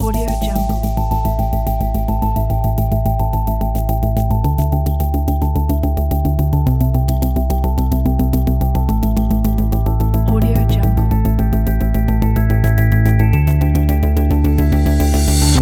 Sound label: technology kit